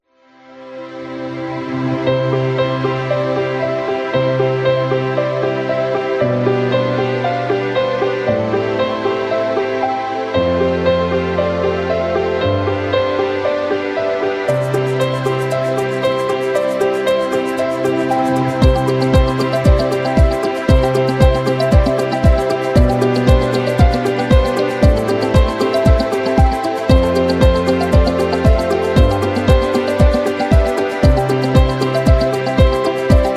Business Background

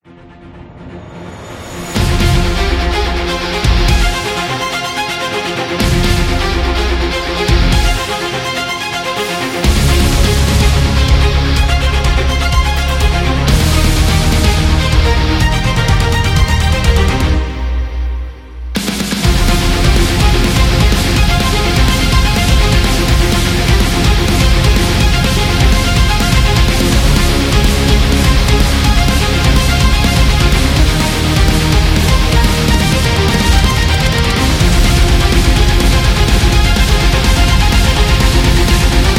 Epic Power Metal

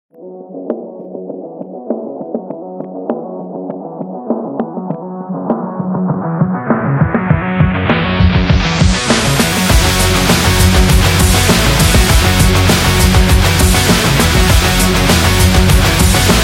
Action Sport; electro rock

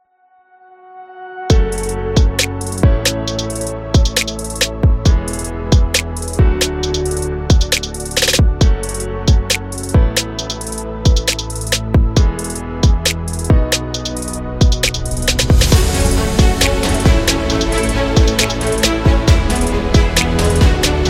Hip Hop Cinematic

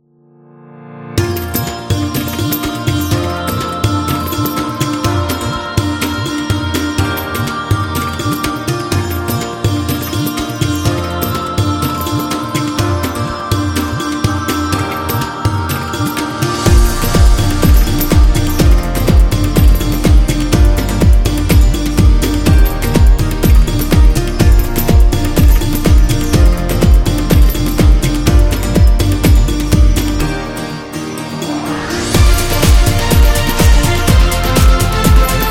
Travel in India

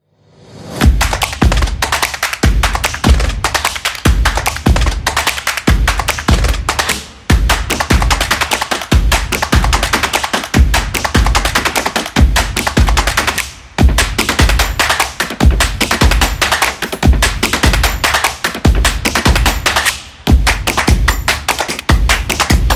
Fun Intro, upbeat drum

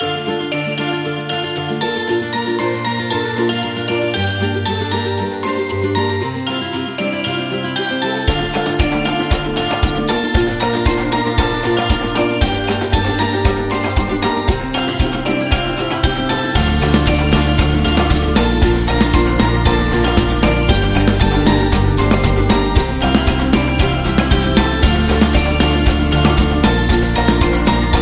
Happy Positive, happy music; happy music vol 9

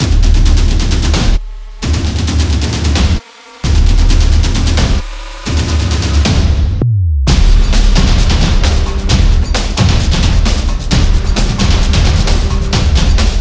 Energetic Drum; casual game

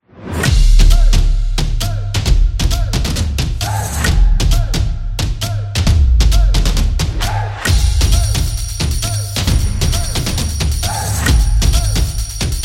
Drum Upbeat; football promo

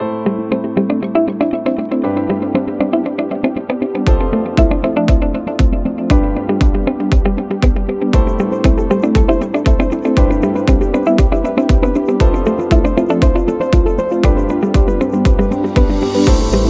Elegant Motivational

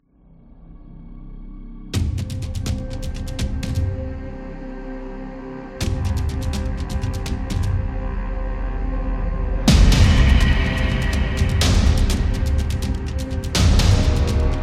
Vikings, tribal dance

